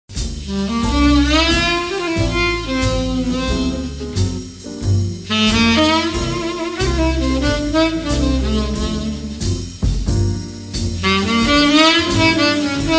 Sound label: Jazz Rhythm